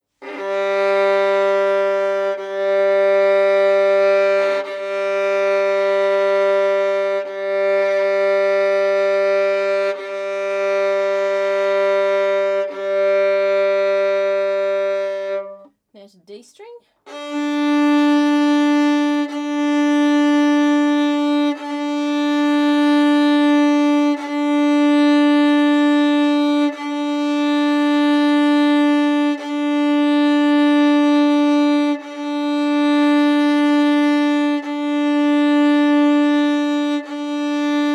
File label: Open Modern Bow Gut